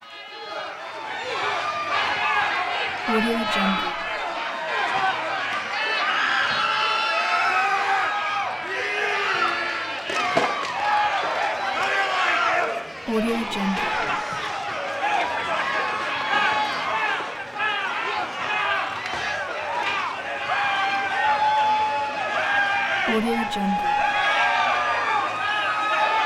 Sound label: bar crowd rowdy yelling cheers reactions; prosoundfx